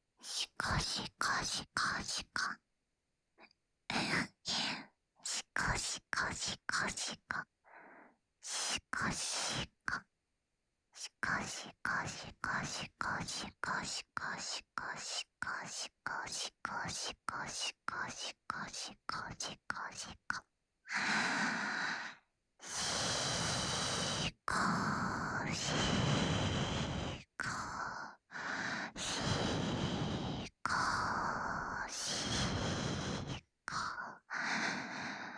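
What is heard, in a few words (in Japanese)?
手コキ（しこしこ・右）手交; SEなし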